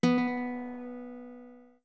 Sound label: Guitar B long